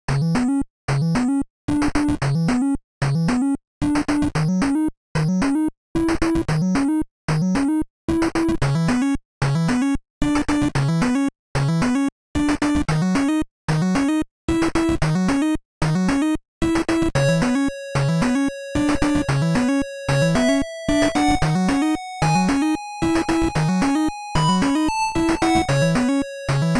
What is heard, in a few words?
8 Bit Hero Wind; Eternal Edge